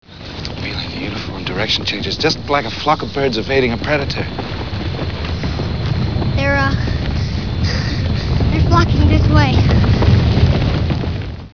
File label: flocking